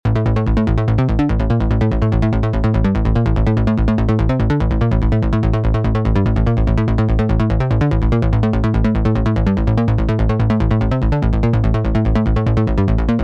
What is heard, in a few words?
Bass Midi Phrygian A, goa trance